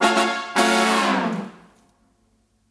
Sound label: Victim Reveal